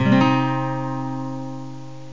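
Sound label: acoustic guitar minor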